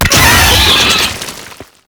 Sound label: Proto Infested Brachiolyst Pain, Vocals, Enemies; Warframe